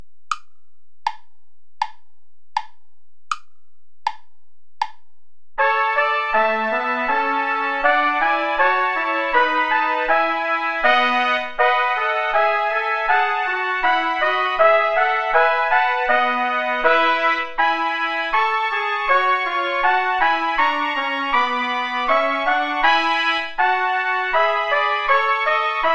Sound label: play along without trumpet